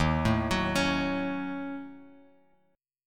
D sharp Minor 7th Flat 5th D sharp, down, Bass Standard; D#m7b5 arpeggiated